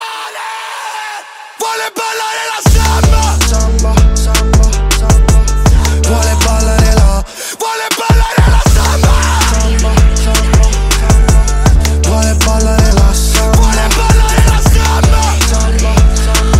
Taxi Samba

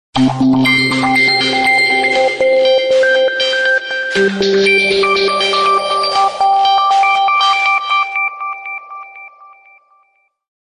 Galaxy Note Faint

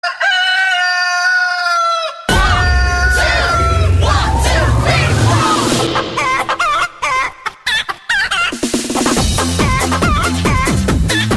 Chicken Song

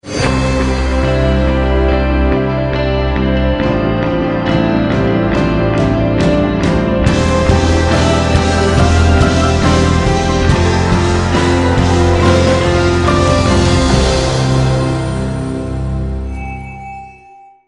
Samsung Galaxy A50s

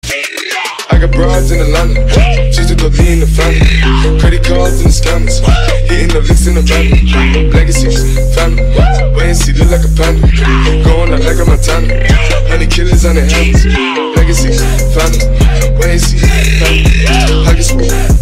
Marimba

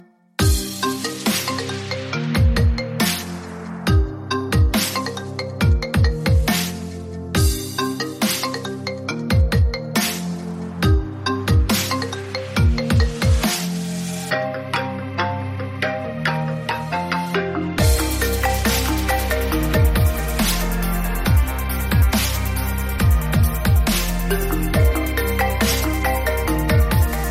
iPhone Garageband

